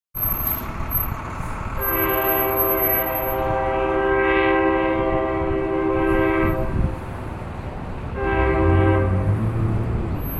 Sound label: train